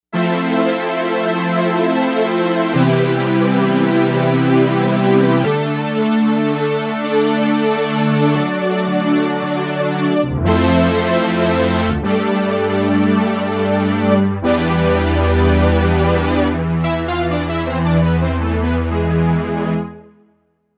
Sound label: CommandingPad, Novation UltraNova; Factory Patches